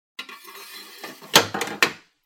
包丁をしまう音

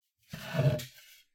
置いてるまな板を持ち上げる音

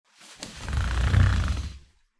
畳の椅子を動かす音; chair

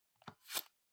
リモコンを取る音

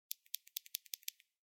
スマホの音量ボタンの音

